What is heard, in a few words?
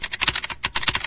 keyboard fast, machines; ambient; Garry's Mod; hl2